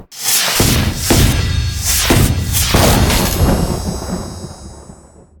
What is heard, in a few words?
Fight Sequence; Wu Xing; genesis